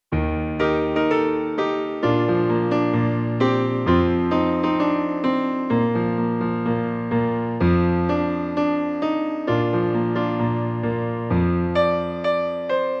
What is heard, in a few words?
Piano